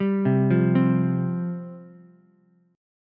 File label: C Suspended 4th C, Ukulele; Csus4 arpeggiated; 0,0,1,3; JGuitar